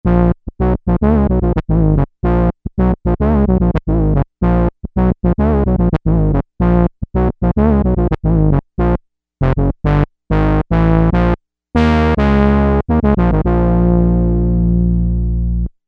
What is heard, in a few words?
5th brass